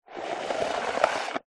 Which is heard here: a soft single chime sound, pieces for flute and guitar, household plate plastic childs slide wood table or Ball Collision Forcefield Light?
household plate plastic childs slide wood table